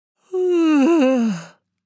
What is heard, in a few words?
Woman yawn